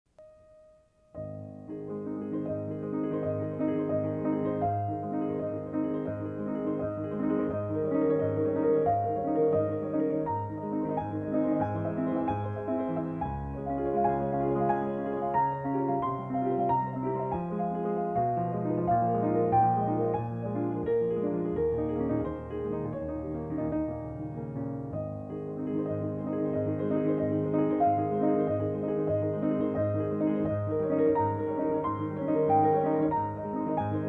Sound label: Harp Etude in A flat Major